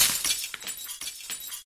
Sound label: Remove Broken Glass; Zomboid 41.78.16; Project Zomboid